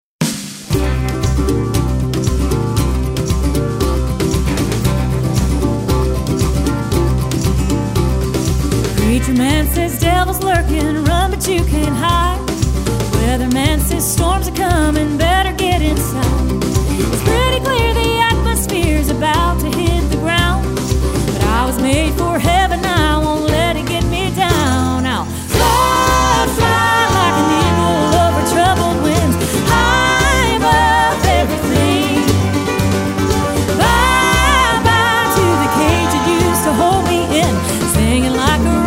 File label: Bird On The Wing